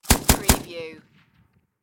Machine Gun PKM

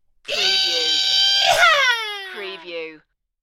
Rebel Yell

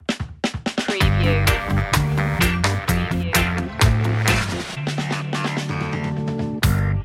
easy reggae vibe stinger

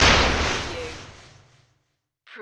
Flash Ghostly

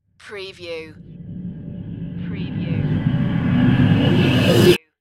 ghost glitch riser

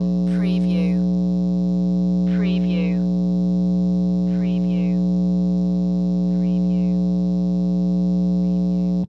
Electric Transformer Hum

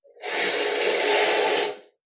Pull Curtain, Curtain Slide Close; Household Sounds / Doors, Windows & Locks

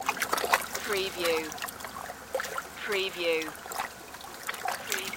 water lapping short

